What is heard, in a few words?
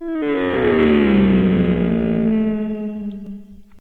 car racecar